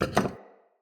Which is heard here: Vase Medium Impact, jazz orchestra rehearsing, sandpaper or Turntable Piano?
Vase Medium Impact